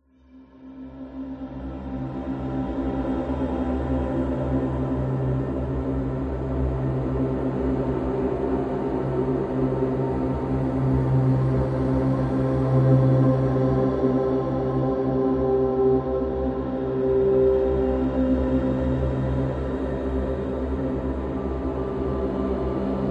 science fiction atmosphere airy mysterious humming; zapsplat